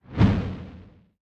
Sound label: Boss Rocket Fist Launch; Winkydink